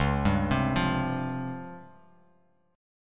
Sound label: Db Major 7th Flat 5th, Bass Standard; 9,10,10,10; arpeggiated; chord shape; JGuitar